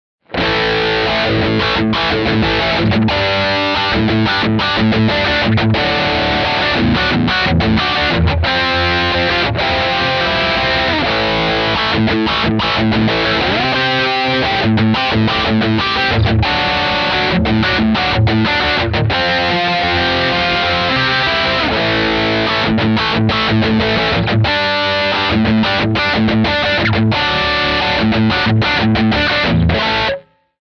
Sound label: Guit Rhythm, Hip Alterna; Surround Session